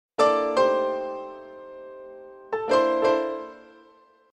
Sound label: Jazz Piano Notices